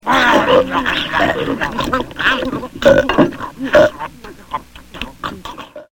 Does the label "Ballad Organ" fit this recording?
No